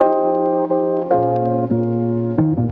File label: keys, phonky loops